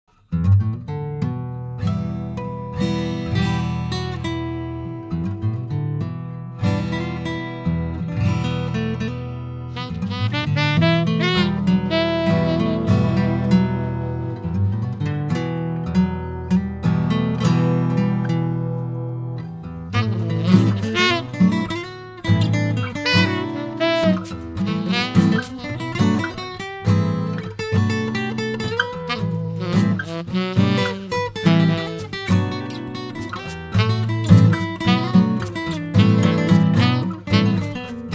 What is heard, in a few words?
instr